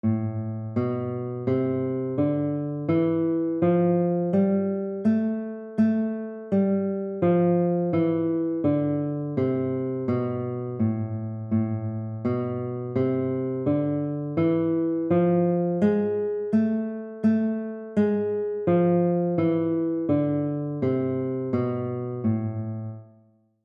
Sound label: A minor scale exercise